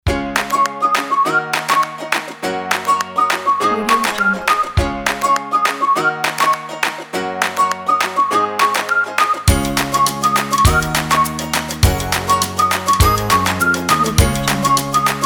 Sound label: fun upbeat